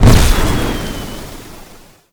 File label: Flamethrower Fire; Lunar NY Ignis Skin; Weapons